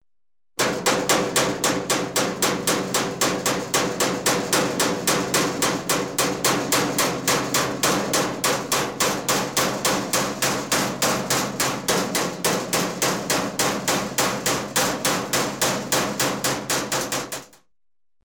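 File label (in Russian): молоток стук по металлу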